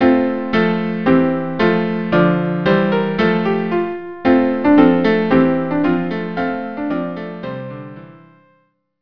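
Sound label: cello piano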